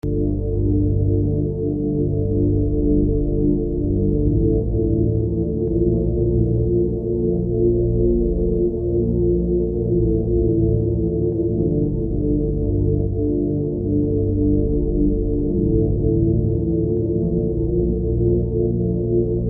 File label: home music lite